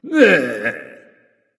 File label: tounge; smite; voicepack; osiris